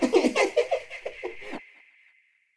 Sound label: tower attack; propack